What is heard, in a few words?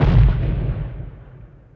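explode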